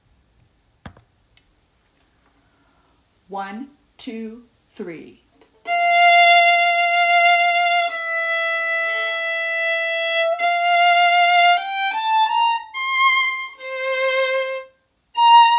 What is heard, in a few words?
Vln2